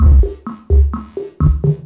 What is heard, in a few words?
wurlitzer, drum loops